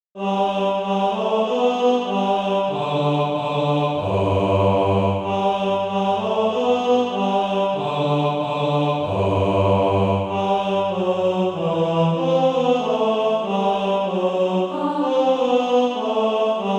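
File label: bass, Practise Music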